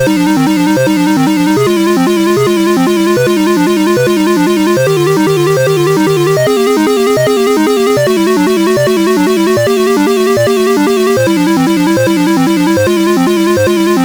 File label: Prelude CSG8580R5